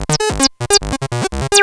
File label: saw